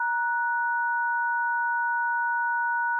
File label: Open, Door Phone; RBX